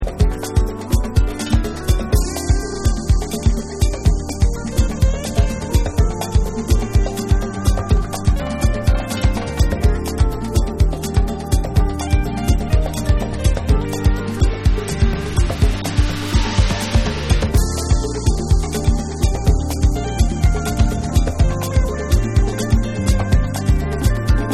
Falling Synth

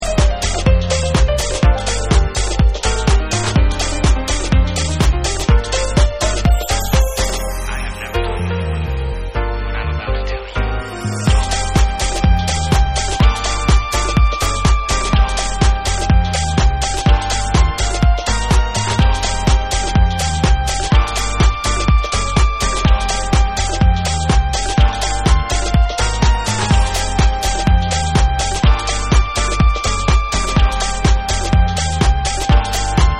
Wind Chimes; Summer Mix

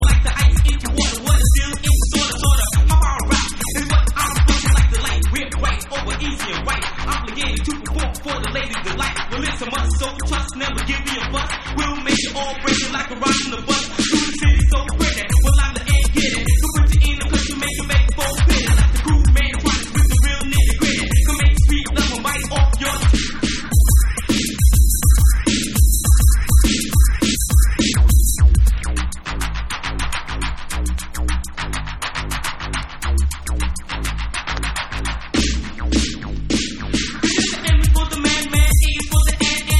Bassline; hip hop crucial 3

